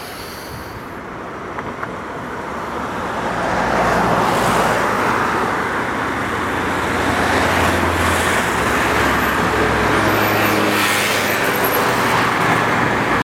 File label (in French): rhone voie rapide; arles